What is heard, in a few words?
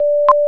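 warning sfx new guy; PC Gamer IT CD 47; USAF; USAFDEMO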